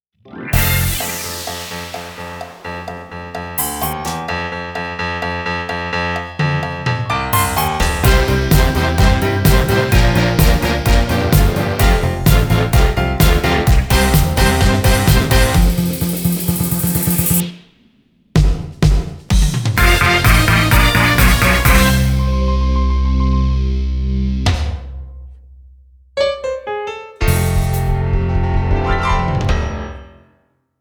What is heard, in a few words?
Floor Exercise Music; artistic compulsories; Level C WAG